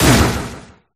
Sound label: single cry; urshifu